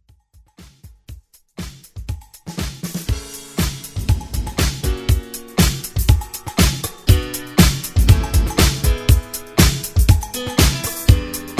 80s Pop Redrum

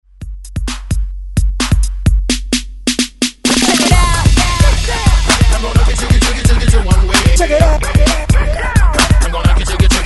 Hype Re Drum 130Bpm

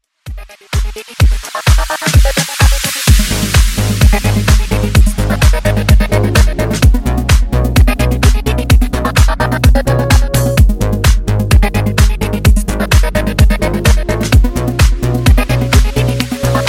Funk Version 128Bpm

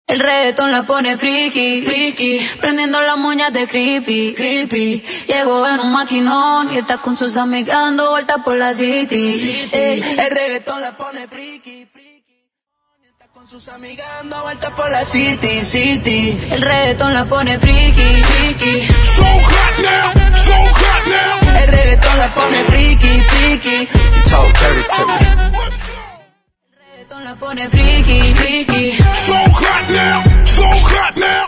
Acap In Mixshow; FRIKI

